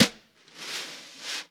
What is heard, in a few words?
Vintage Snare Drum; 5.5X14; Q Up Arts; Johnny.C's Vintage Snare Drum